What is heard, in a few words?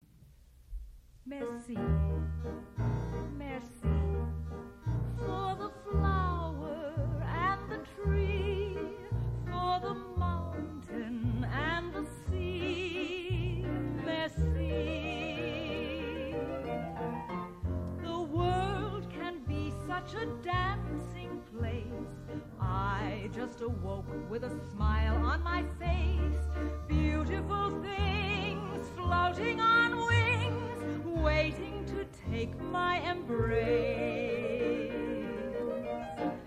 Female Voc